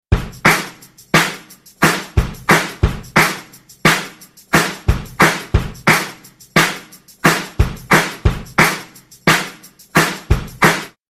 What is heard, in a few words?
easy street drum beat ringtone